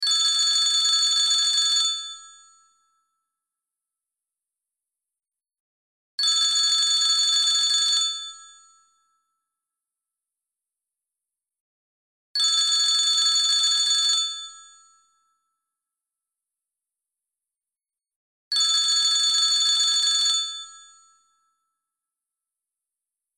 Telephone americain

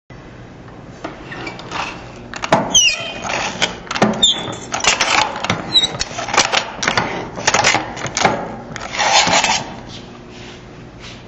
Cisaille à feuille manuelle

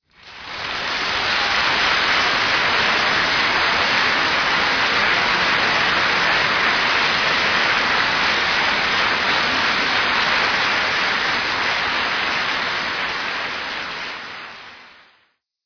applaudissement

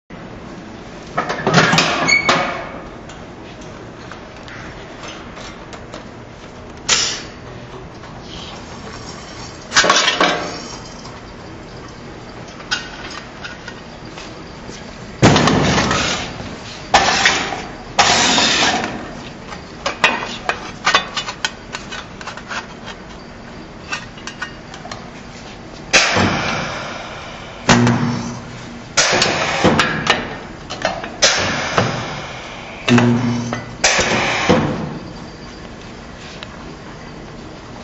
Soudeuse par point

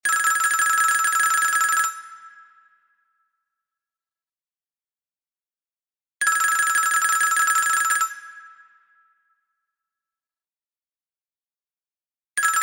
Telephone americain

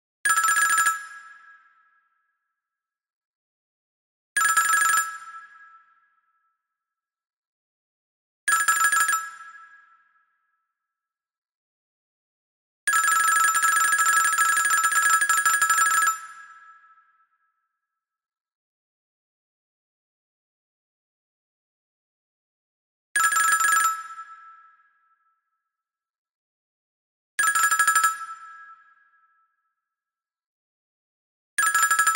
Telephone venezuelien

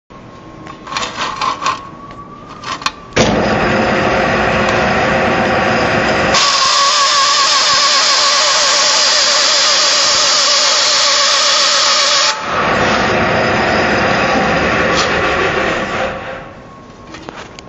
Scie à ruban

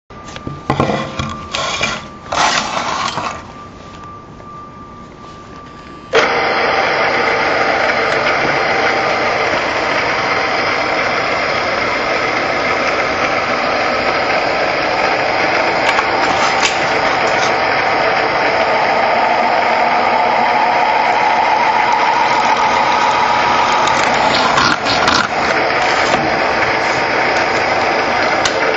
Perçeuse à colonne

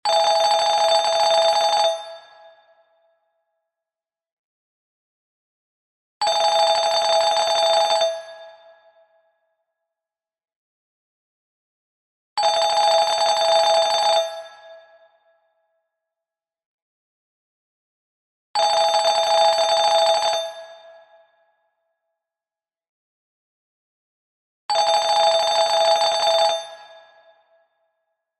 Telephone francais

